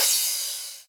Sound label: soft hit finish